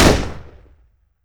phantom fire npc, weapons

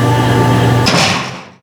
elevator open; gesource; doors

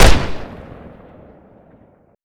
kf7 fire; gesource; weapons

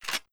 grenade launcher cylinder; weapon; glauncher; npc

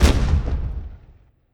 grenade launcher fire; weapon; glauncher; npc

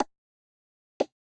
tick tock; gesource

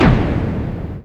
temple door close; gesource; doors; temple classic

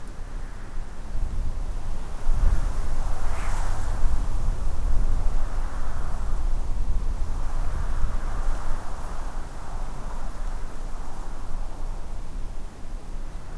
wind sand; gesource

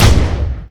dd44 fire, weapons

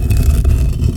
temple door move; gesource; doors; temple classic

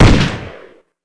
d5k fire npc, weapons

